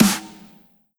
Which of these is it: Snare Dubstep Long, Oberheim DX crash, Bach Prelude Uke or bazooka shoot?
Snare Dubstep Long